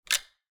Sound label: revolver prepare; ranged weapons guns; psfx